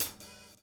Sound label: DOWN, DOWN KIT 78